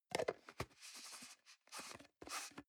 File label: plastic can small lid screw close soft